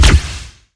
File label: shoot, plasma rifle; weapons